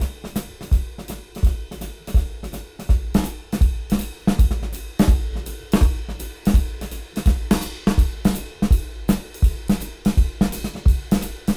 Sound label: Swing Beat 165 BPM; AMG - Keith LeBlanc Phat Butt Jams